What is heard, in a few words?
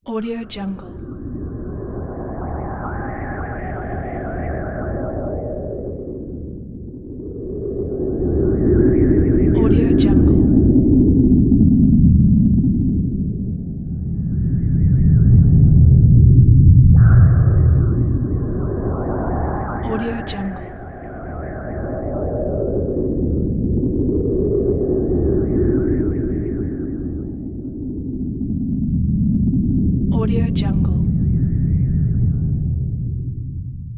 gusty wind